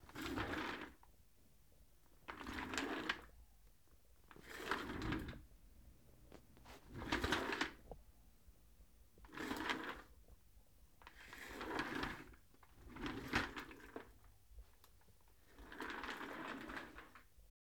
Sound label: Rolling Chair